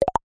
multimedia notification pop message tooltip small 3 clicks